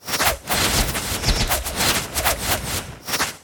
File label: battle arrows